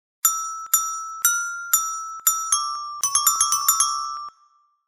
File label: melody